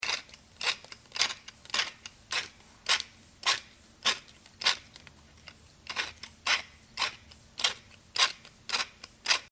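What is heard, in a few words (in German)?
Pfeffermühle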